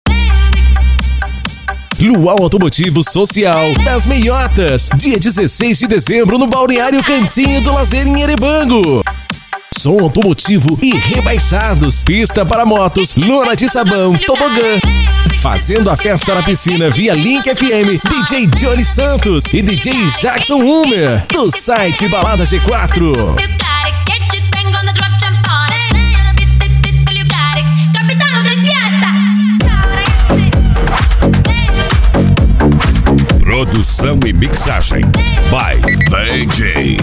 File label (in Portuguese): luau automotivo